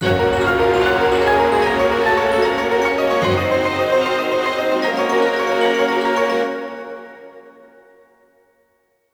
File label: Jingle Item